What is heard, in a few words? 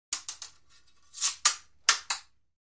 Fencing Sword Cross